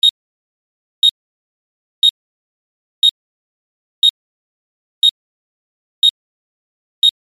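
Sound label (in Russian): электронный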